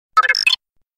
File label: glitch